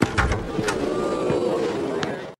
crowd

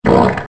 perfect big fart

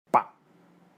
dog bark; dog of wisdom

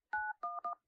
dialing 911; school

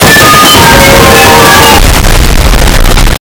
chews and explosion; beepteebops

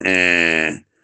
ehhhhhhhhh

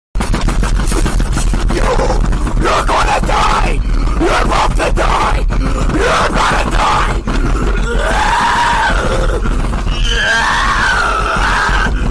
aahhhrrrgggggggg; ishowspeed

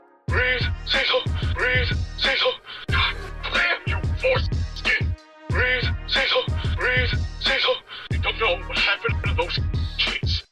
breathe

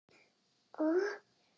cute anime gasp sound voice

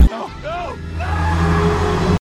ice cream scream

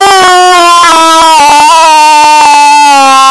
screaming in mic

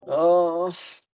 ooohh

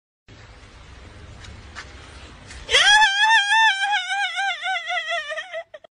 tiger laugh

